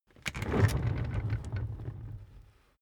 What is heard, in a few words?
office chair loaded trundle